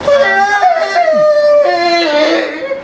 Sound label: aaeaae